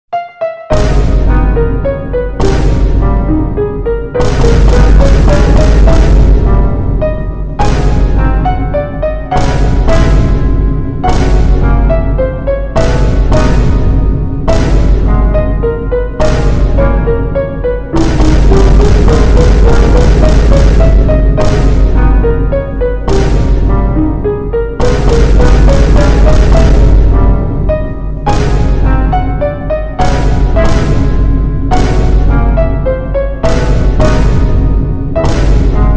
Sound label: sad song vineboom; mario rpg; vineboom mayhem